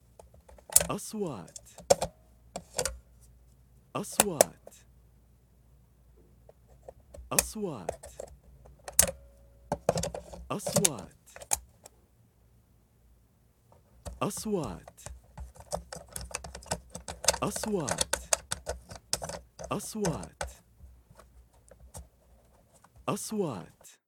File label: lens put lens for camera and take it of